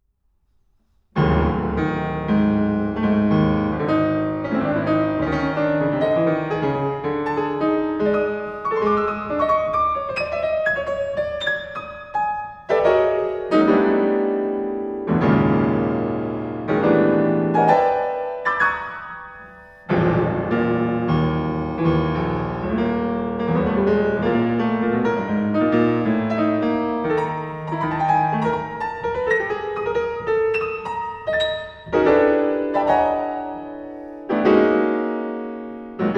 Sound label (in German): Sonatensatz Klavier